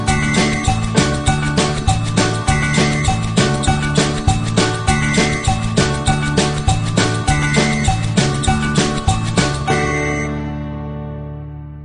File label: train goes